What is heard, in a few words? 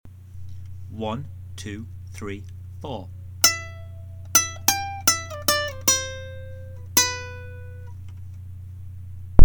riff, ukulele